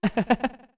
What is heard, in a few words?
Laugh; Zelda